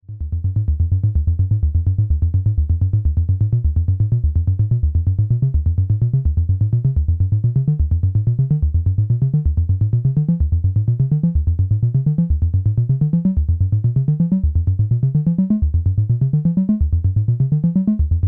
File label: reset at step, analog synth SEQ16